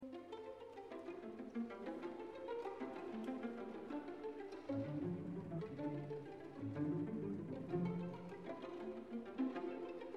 Simple Symphony for string orchestra, Playful Pizzicato; Britten